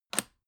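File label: aluminium case small close short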